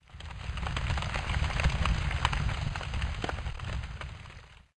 lava; portlux

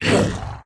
orc damage, monster

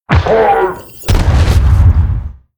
boss, monster; zehgo

